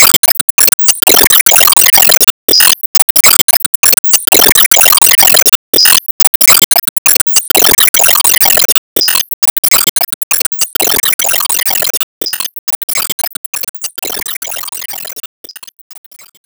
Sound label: Enemy Jet Active Charge Loop